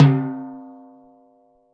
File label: Timbale Low Open